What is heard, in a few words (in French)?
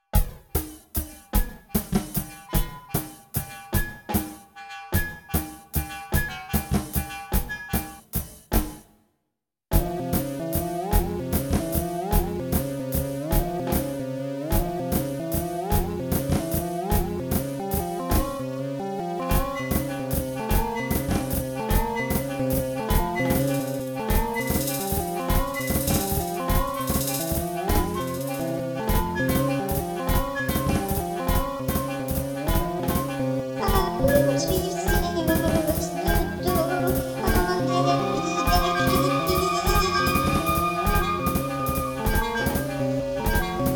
Valse de droite; Musiques de films